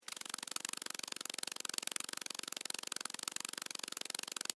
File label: Insect Wings Fly, Steady, Bug; Animal; Wing Sound Effects; Animals